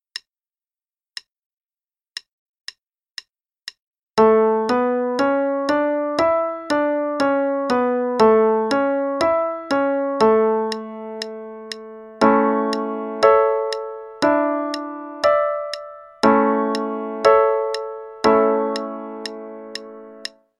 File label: A Major (qn=120); Level 1 - 2nd Ed